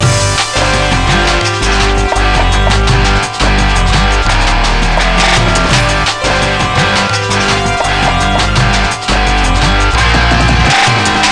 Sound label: Tabla Grunge